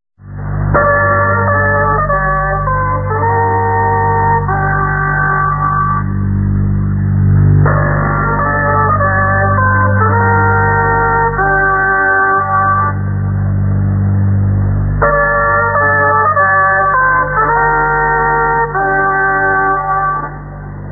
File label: interval signal; Radio Tirana external 1988; 地拉那广播电台英语广播开台; Interval Signals Onlines